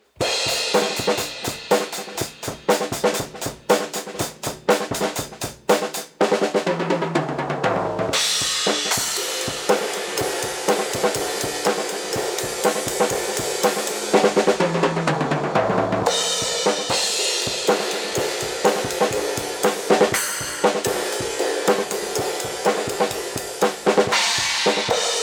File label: LOWCUT 500.00Hz; Analog Pro